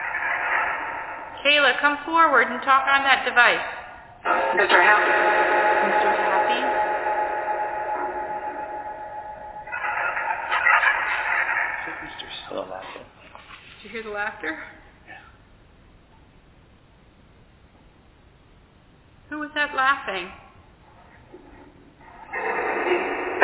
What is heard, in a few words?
Phasma; Second Floor; martinsburg roundhouse investigation